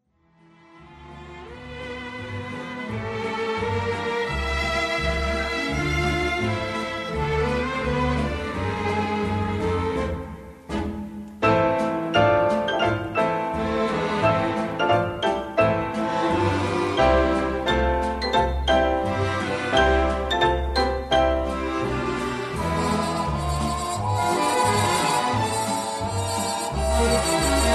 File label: Dance, piano; Piano Concerto In A Minor